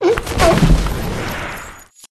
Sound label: Seal Die, pets